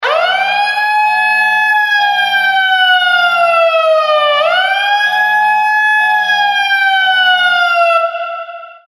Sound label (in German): bootshupe bigben sirene